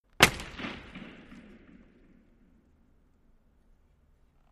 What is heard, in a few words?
Hockey Player Stick